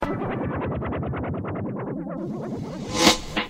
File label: short message tone